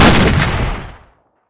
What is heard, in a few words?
BAR shoot